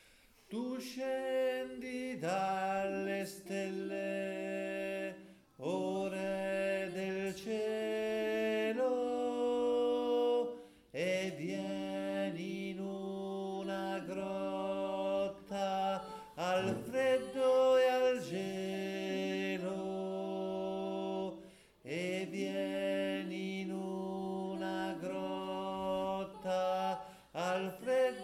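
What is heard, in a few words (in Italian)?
Tu Scendi Dalle Stelle, tenori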